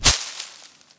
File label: bush; Mafie 1